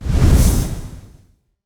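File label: Rocket launch; Hovl Studio; SFX Battle sounds; WhiteMan Unity2D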